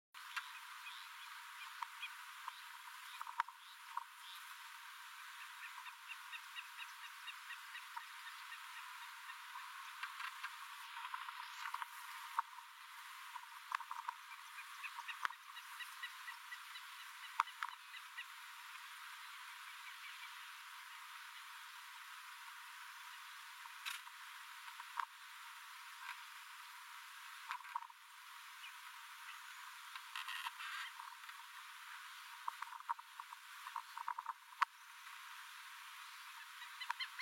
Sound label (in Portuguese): Soco boi baio Botaurus pinnatus